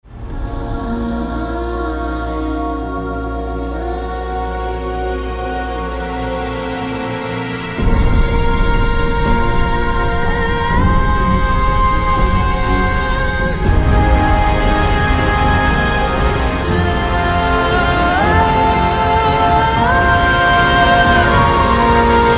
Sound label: Horror, Horror Music